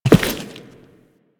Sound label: Step Default, Footsteps